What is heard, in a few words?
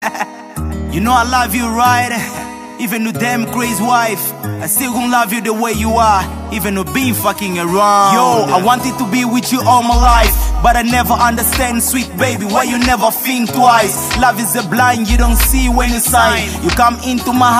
african coffee fantasy ringtone